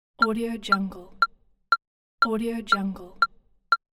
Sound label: electronic metronome